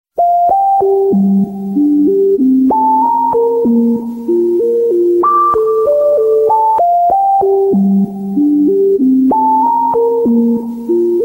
iphone polite 3g ringtone

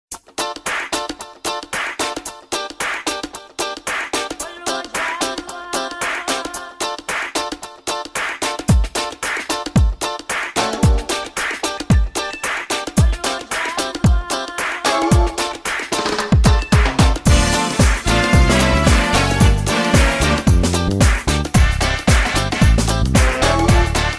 iphone 6 remix ringtone

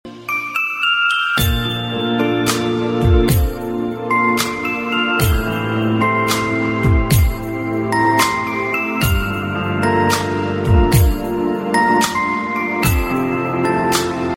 iphone piano nice ringtone; 6 plus

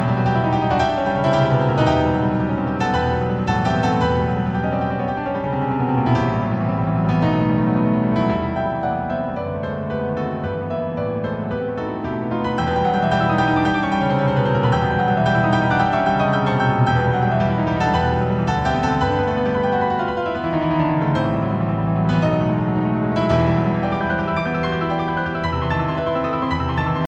piano custom ringtone; i phone x plus

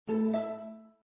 windows logon ringtone

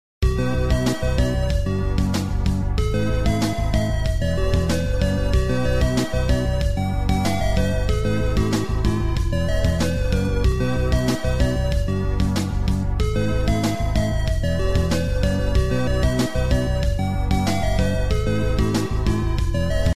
ringtone; samsung

